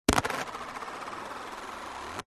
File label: money coins x2 australian 2 dollar coins set down on plastic lid and spin